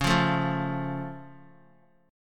C sharp Augmented, C sharp, down, Guitar; C#+ strummed

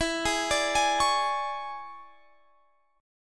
E 13th

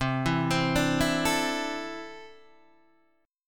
C 6th Add 9th C down Guitar DADGAD

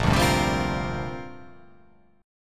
A sharp 6th Add 9th

